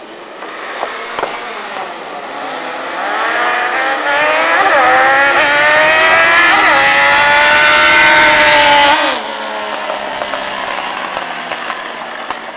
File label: wheelie